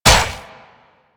step default; Warframe; Infested; Zealoid Prelate

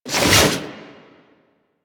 Transform Claws Whoosh; Warframe; Weapons

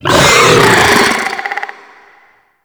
Infested Ancient Attack, Enemies; Warframe

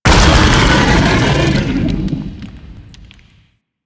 Golden Maw burrow exit; Warframe; Ambience; The War Within

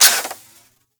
Harpoon Gun Mech Stop; Warframe; Sharkwing; Grineer

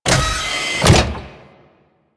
Supply Cache Open; Ambience; Warframe; Venus Open World

